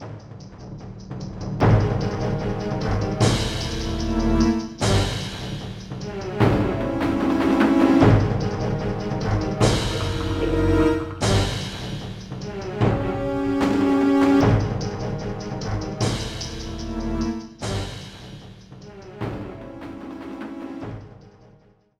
BGM Combat; District 2

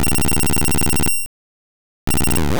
Rock Slide; sfx; attack moves; pokemon; gen 1; rby

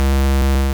Sharpen; pokemon sfx gen 1 attack moves rby

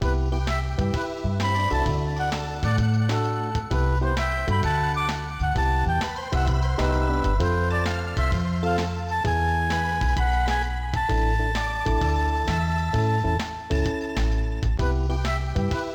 Score Ranking; tetris plus 2; arcade

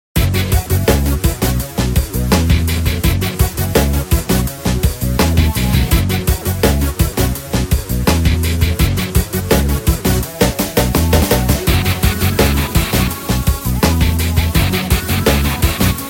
Shop Music; M.A.T. Mission Against Terror; MAT 1 Online; windows

